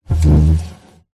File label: foley wooden box crate lid slide open recorded from inside